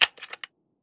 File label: scar20 clip out, weapons; Counter Strike Model Pack; CS2